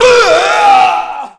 human death

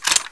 hk23 foley, weapons

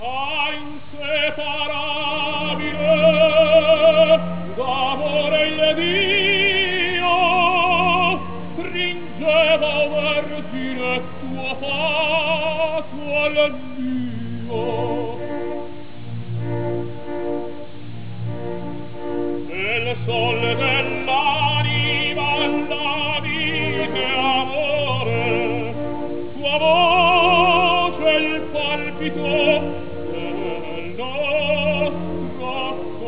Opera, ambience

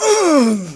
pain

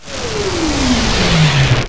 nuke fly, weapons

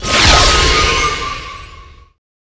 power up fail

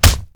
boxing hit

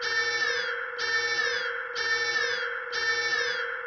alien alarm

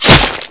hit normal

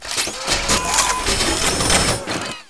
cart foley, weapons

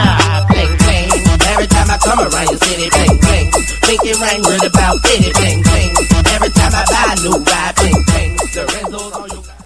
grenade

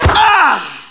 bhit flesh

